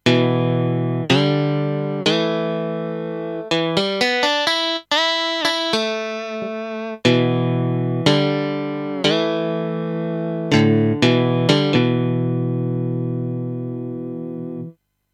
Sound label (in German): E Guitar trocken